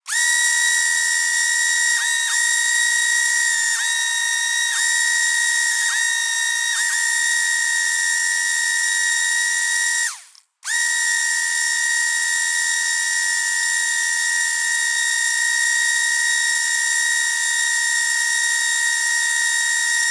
leisure drone toy small nano motor whirr adjustments